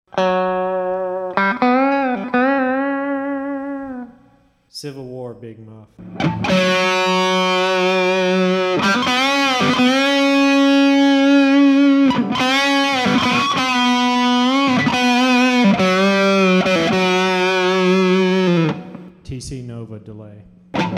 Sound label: EMG TONE SOLO CWMUFF GE7 TD CS2 CE2 NOVA